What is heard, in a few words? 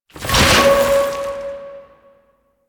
Citrine Protective Shell Charge D; Warframe